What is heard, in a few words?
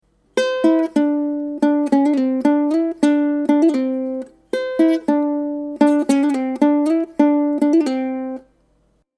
Pop Song, ukulele